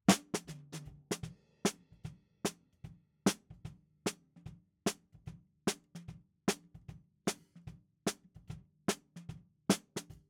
SNR BTM

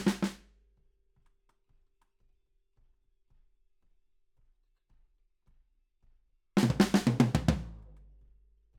Ride

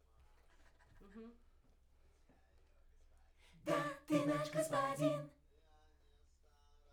Backs; SCHOEPS

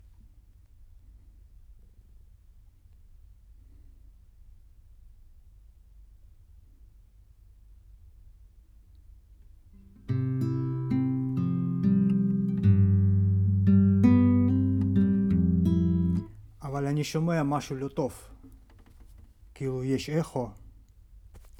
Tape GTR

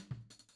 Tom

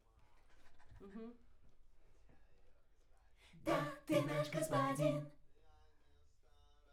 Backs AKG LEFT

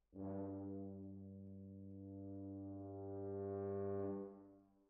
Decca, Jazz for piano

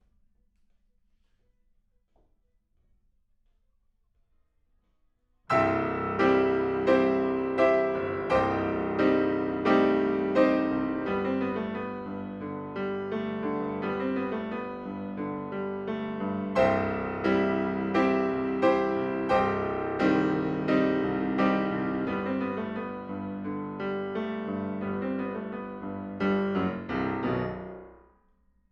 PIANO

